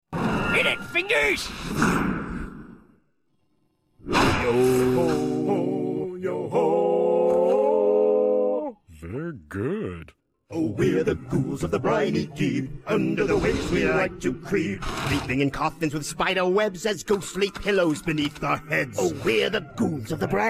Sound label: vocals; SpongeBob SquarePants; Nickelodeon UK